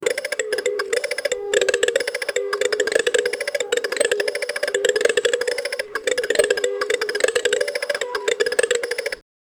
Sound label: cartoon toy train on trac